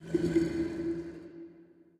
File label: spin Start; temple of nudges